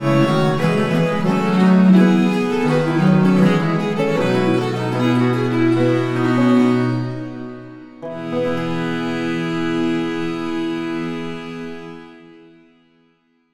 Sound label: cadences